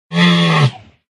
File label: rhino attack voice bottom